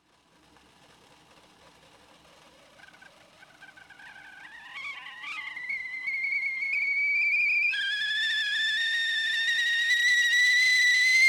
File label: Kettle Boil and Whistle